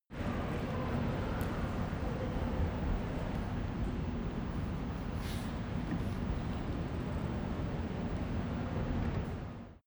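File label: subway station, Rail traffic - Subway; bahnverkehr u bahn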